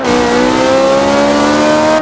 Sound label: third, vehicles; gmod; tdmcars; fer 458